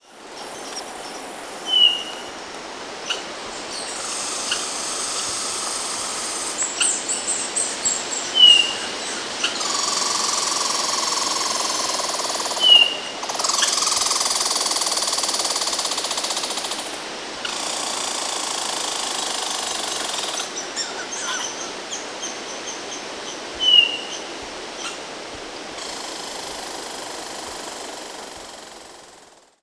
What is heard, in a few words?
Hermit Thrush; Thrush-like calls